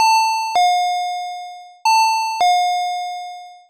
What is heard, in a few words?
chime entrance